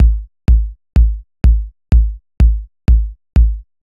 bd

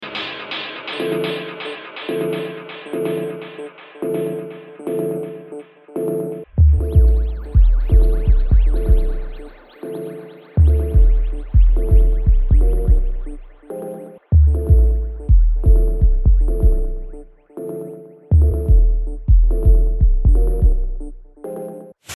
Synth Bass

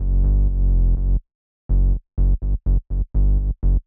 kickstart

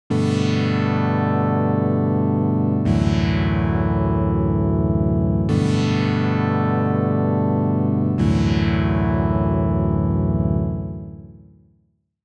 Massive Analogish

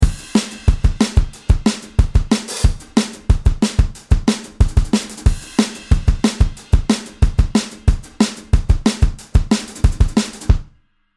Drums

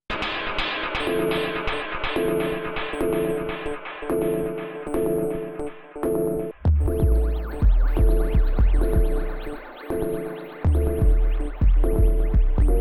Synth Bass Comp Hard

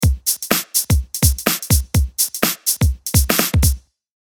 Beat

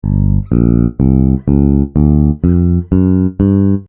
G Minor Pentatonic Leading Notes

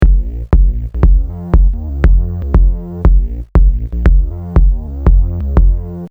Beat

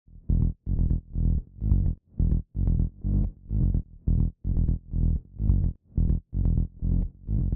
Sub Bass Solo

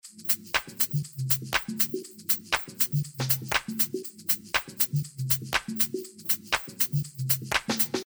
drums wet

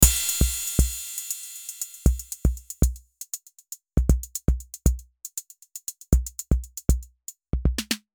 Drummer

